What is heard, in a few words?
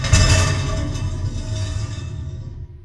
STOVE SMASH, PERC METALIC; Rarefaction; Poke in The Ear With A Sharp Stick